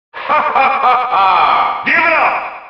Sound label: hahahaha give it up; sirius